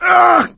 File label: hurt critical; voice; source engine; left 4 dead 2; survivor